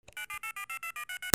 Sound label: PHONES